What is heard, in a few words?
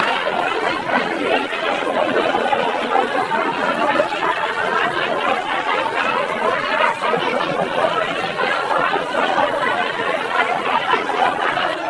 laughter